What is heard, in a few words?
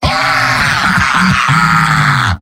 laugh happy; demoman; tf2; garrysmod; mvm